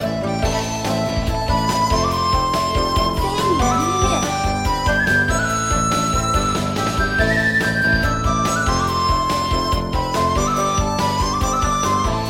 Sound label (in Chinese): PVE战斗